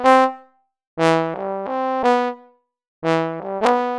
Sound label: ska, brass